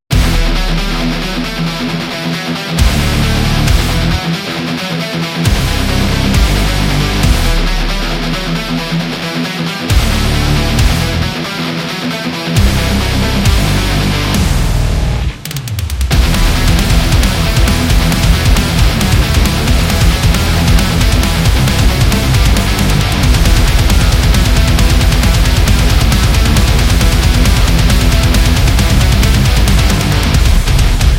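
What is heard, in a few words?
Combat, epic cinematic trailer music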